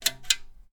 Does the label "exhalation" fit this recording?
No